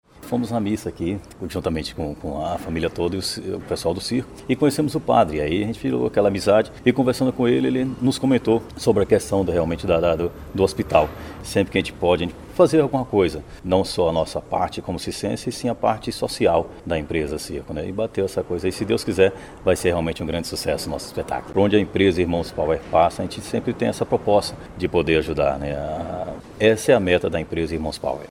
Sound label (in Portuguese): rock power